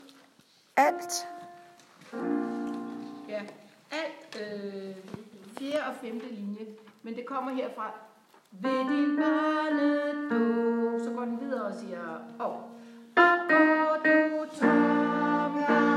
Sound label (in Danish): sirensangen vers alt